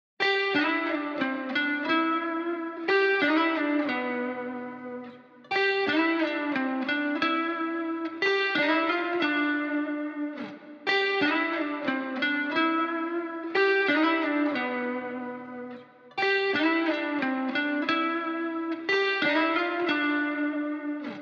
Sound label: 90 BPM A Min Lead, guitar; Loop Stems; Cymatics; Brand New